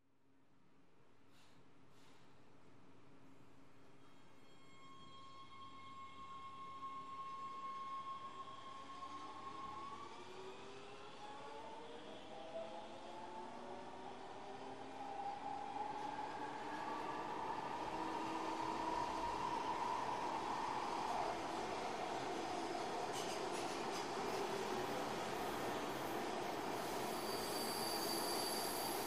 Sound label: Subway Train Accel